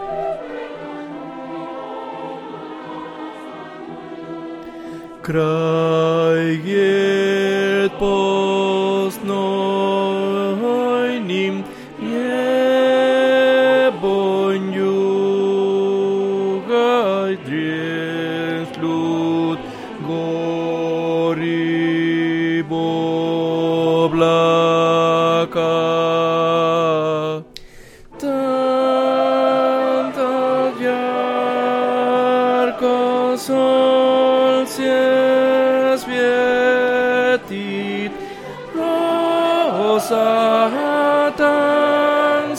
TENORES; REPERTORIO ÓPERA; Danza Igor

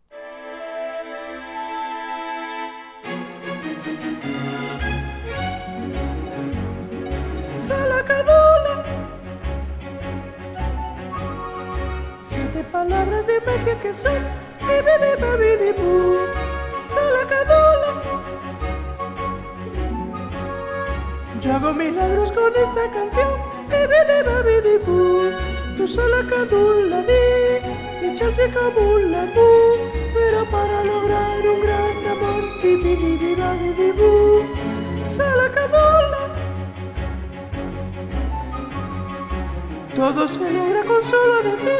sop

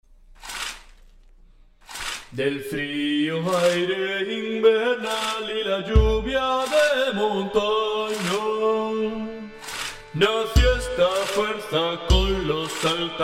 Corazon hielo ten

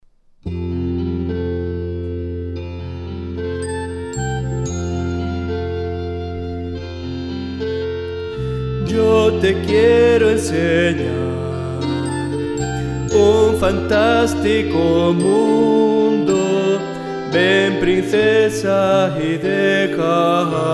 tenores y bajos

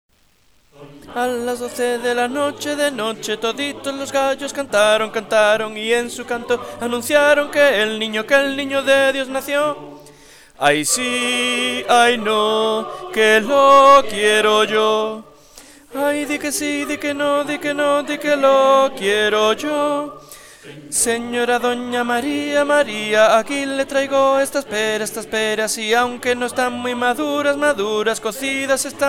AY SI AY NO TENORES; navidad

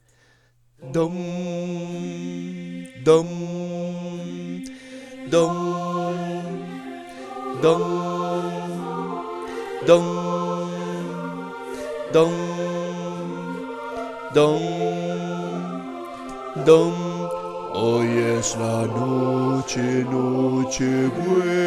bajos

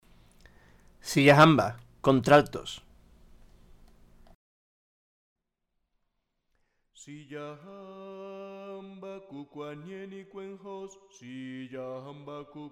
contraltos; siyahamba

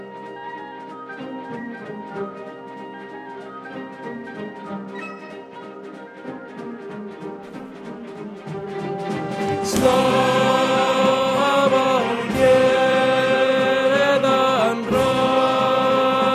Danza BAJOS; REPERTORIO ÓPERA

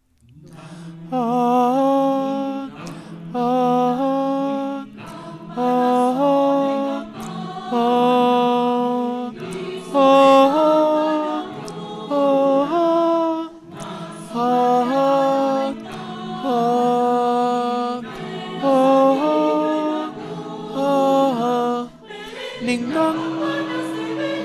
Campana sobre campana Altos; navidad

